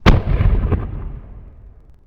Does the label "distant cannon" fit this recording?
Yes